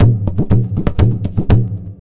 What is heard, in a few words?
Tabla, 120 BPM; Zero-G; Pure Tabla